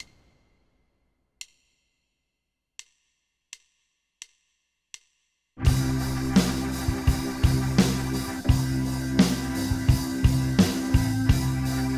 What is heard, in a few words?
C Major Chord Backing Track